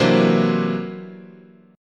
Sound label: C 9th Flat 5th, guitar chord